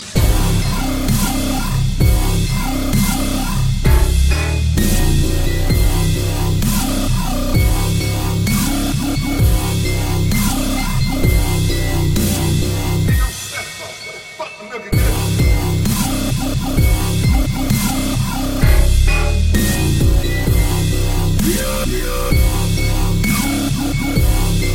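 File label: Jingle Bells Dubstep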